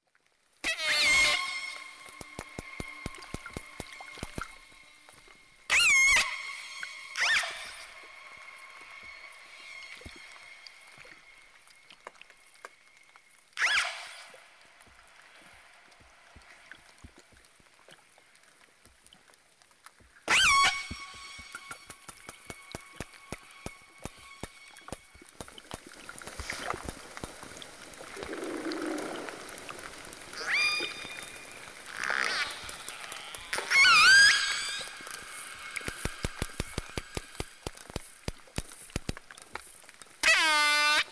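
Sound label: Whale voices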